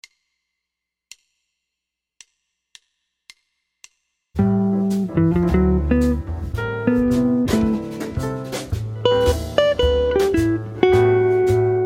am7 d7 avec cmaj7 et cmaj711, guitare jazz

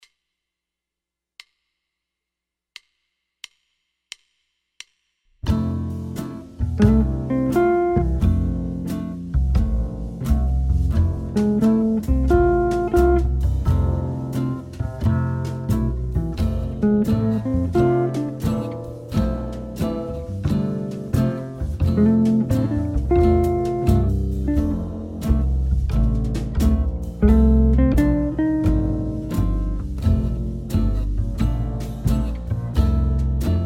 Playback, guitare jazz; osons charlie christian etape 15

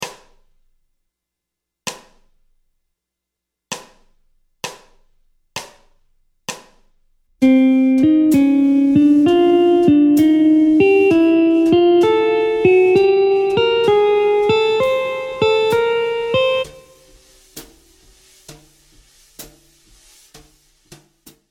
Gamme bop asc Pos 31 C min dorien, guitare jazz; boper ses gammes position 31

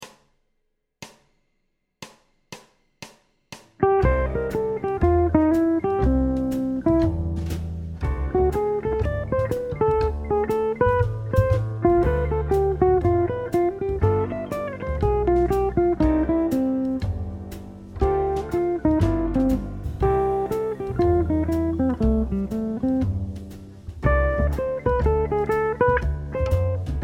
chorus 7, guitare jazz; solo; techniques de solo

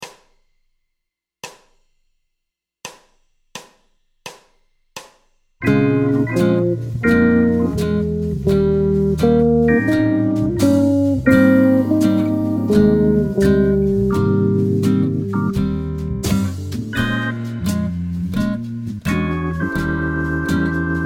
arp liaison Bbm7 Eb7 Ab∆, guitare jazz; ii V7 I∆ majeur; transformations de l arpege de liaison

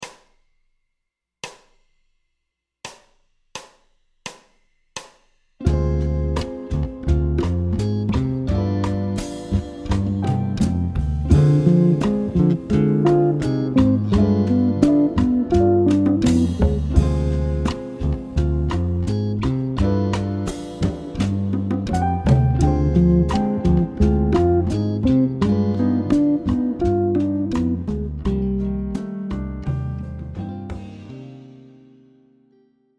Phrase Cadence ii V7 en min, guitare jazz; arpège 9 descendant; motif jazz

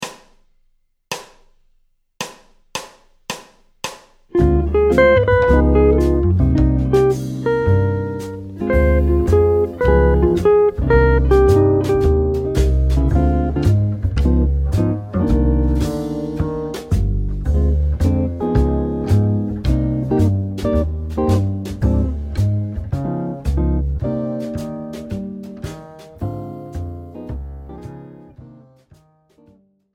Triades et sub Trit min, guitare jazz; substitution tritonique de la dominante; triades en mineur

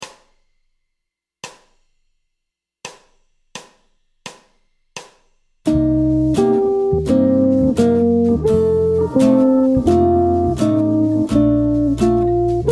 arp liaison Cm7 F7 Bb∆, guitare jazz; ii V7 I∆ majeur

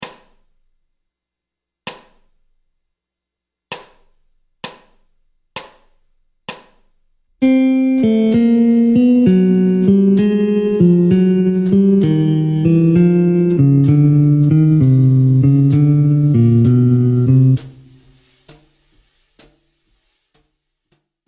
Gamme bop desc Pos 52 C min harm, guitare jazz; boper ses gammes position 52

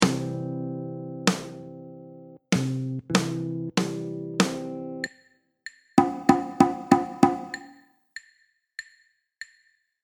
rythme, guitare jazz

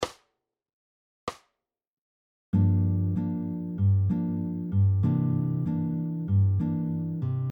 rythmique bossa; bossa nova

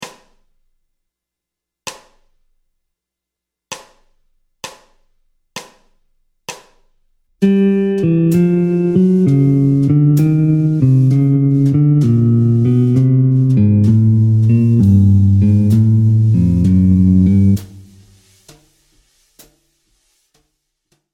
Gamme bop desc Pos 62 G min harm, guitare jazz; boper ses gammes position 62

